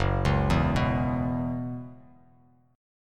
Gb 7th Suspended 4th Gb down, chord; Gb7sus4; arpeggiated; JGuitar

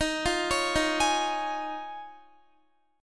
D sharp 7th Suspended 2nd Suspended 4th, Guitar; D#7sus2sus4; arpeggiated

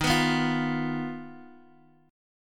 Eb Suspended 2nd Sharp 5th, Guitar, DADGAD; Ebsus2#5 strummed; JGuitar

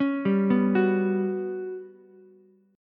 G Major 7th Flat 5th, Ukulele; GM7b5 arpeggiated

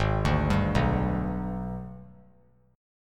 Ab Minor Major 7th Flat 5th, down; AbmM7b5; arpeggiated

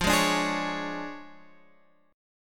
D Minor Major 7th Flat 5th, F

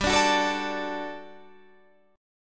G Major 7th Suspended 2nd G down

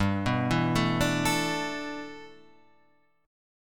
G 6th Add 9th G Guitar Standard, chord; G6add9 arpeggiated

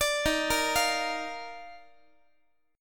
Eb Major 7th Suspended 2nd Eb, Guitar; EbM7sus2; arpeggiated; JGuitar

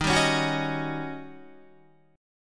D sharp 7th Sharp 9th Guitar; strummed; JGuitar

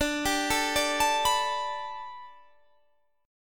A 9th Suspended 4th, D

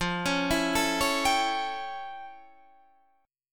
F Augmented Major 9th, Guitar, Half Step Down

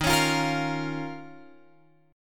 Eb 7th Suspended 2nd, Guitar, Open F; strummed; x,10,13,12,13,12

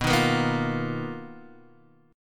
B Major Sharp 11th, Guitar, DADGAD

